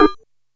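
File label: Industrial conga; Yamaha DTXpress; Effect 1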